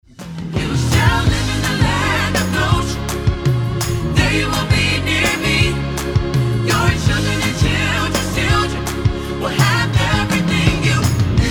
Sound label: 432 hz